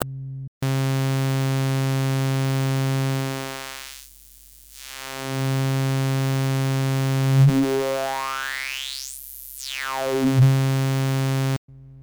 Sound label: form hi; emu workshop